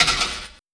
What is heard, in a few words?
Percy Hit, FX